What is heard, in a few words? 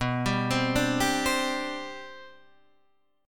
C Suspended 2nd, B, guitar chord; C2/B arpeggiated